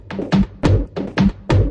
140 techno toms, DRUM LOOPS and BREAKS

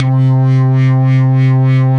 Synthesizer Middle C Notes, Lead

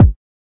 kik

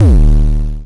iELECTRIBE kick; Kicks; single hits

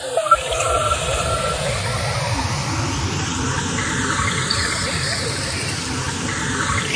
oceanfloor ripples, electro and synthetic

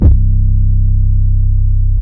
Long Dark Distorted 808; drums single hits; Khezie 808s

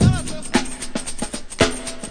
113 source1, drum loops and breaks

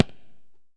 "te", Tabla; ETHNIC and WORLD PERCUSSION

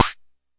Jomox MBrane, drum machines; Jomox MBrane 11

